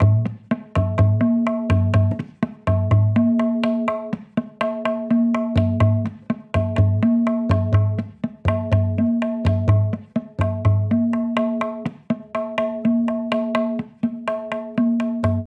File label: Tabla 124bpm A